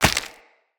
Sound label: Agaric Mushroom Grow; Resource Materials